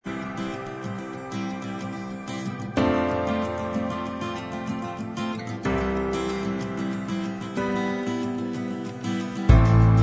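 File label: instrumental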